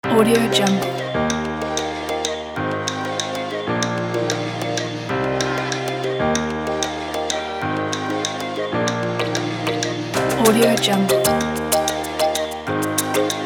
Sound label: afrobeat reggaeton